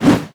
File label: attack whoosh, zombie; creatures